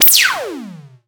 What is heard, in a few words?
Laser